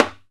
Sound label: Timbales; E-MU Producer Series Vol. 2 – More Studio Essentials; Composer; Timbales Xswitch